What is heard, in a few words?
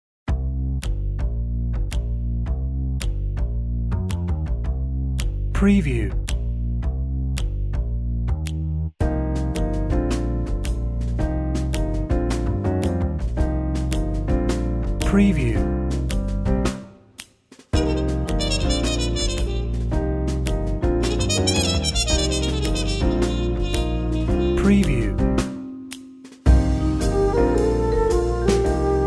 Lady Swing Soft Jazz